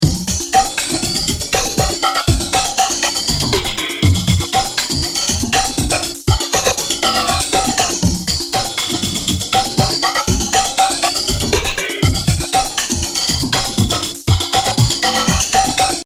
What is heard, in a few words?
stylus effect